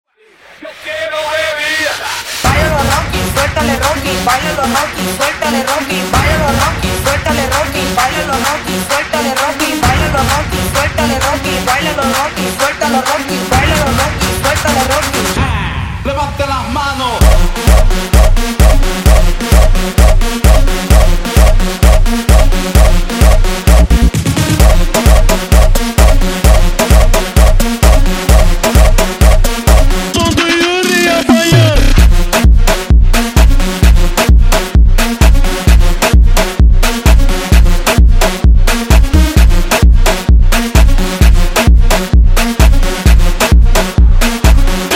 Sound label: Acapella Intro Extended 130bpm